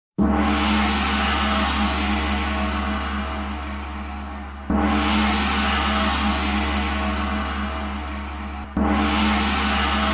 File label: Gong Effect